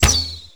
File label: Daikyu Prime Quick Fire Shot C; Tenno; Daikyu Bow